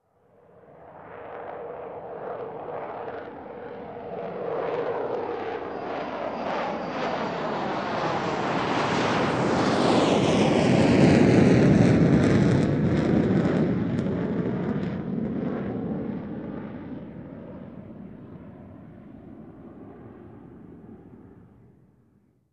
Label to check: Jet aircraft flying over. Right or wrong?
Right